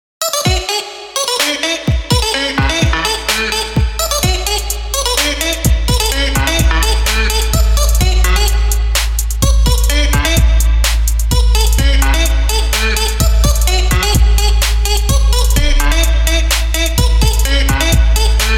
nokia tune remix remake

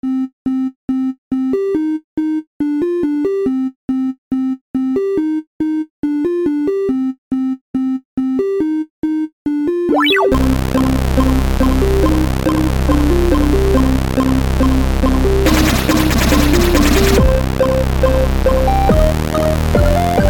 chiptune

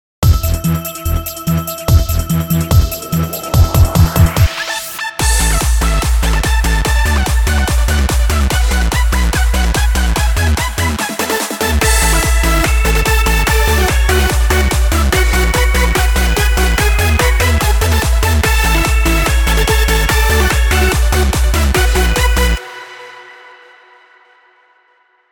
techno remake

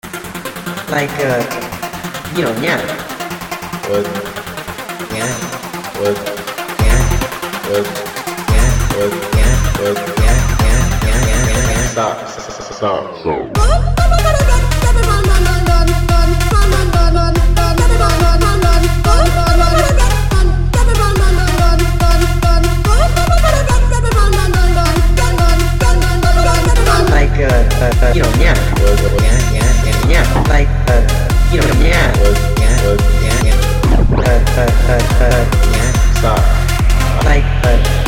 EDM Big Room

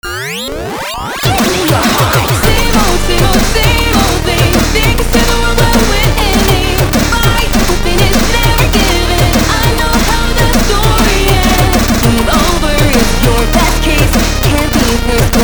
Chiptune Remix

